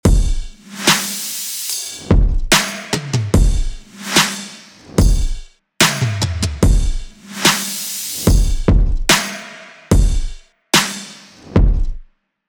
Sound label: Action Drum Loop; FOCUS Preset Pack